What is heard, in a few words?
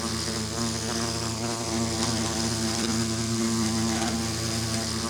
bee loop; Minecraft